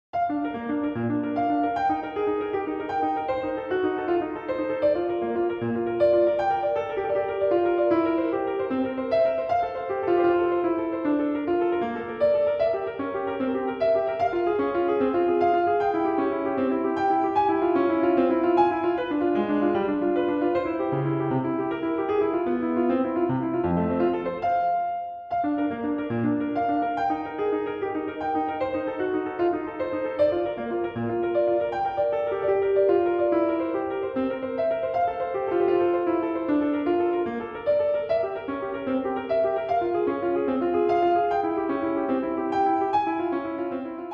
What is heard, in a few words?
Partita No. 1, BWV 825 No. 7, Gigue in B-Flat Major